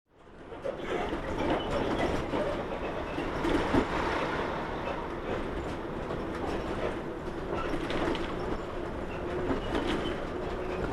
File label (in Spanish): trolebus; ciudad de mendoza; linea pellegrini